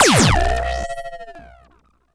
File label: Cwejman S1; Helmet Hurk; DevSnd Cwejman S1 - RD